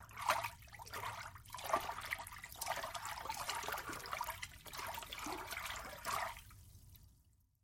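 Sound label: sounds of barrel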